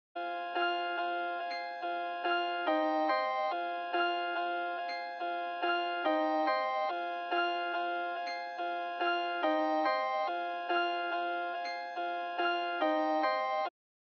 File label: 142 BPM F Min Piano; Melody; Loop Stems; Cymatics; Missed Payments